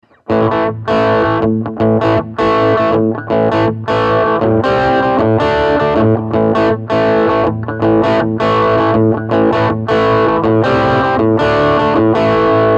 brit combo; Cube 40XL

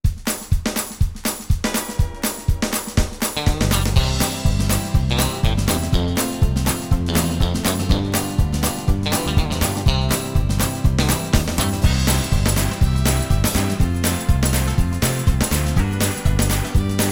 southern twang

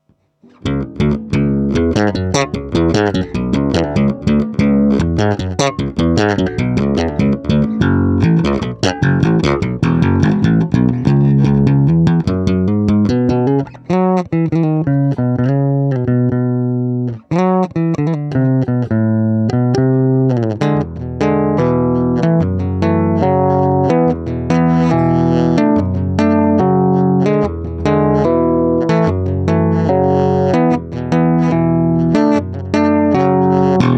bridge, Fernandes A4X(08)3SB

